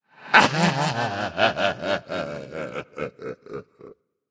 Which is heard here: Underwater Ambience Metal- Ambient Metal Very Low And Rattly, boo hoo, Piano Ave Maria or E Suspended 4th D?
boo hoo